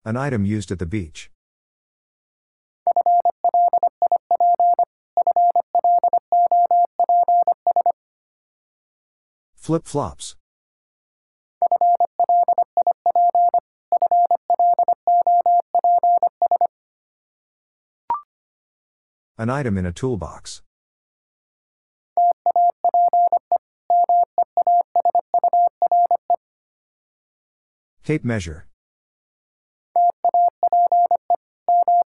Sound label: Difficult Words with Hints, 25wpm, morse code